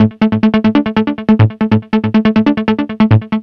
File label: NRG Lead, trance